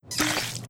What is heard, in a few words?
splash; cheesy-themepark; superSlideSplash